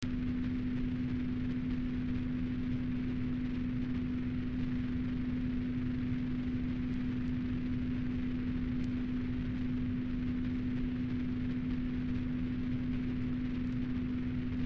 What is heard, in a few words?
low hum and white noise